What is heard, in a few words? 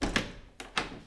Door Open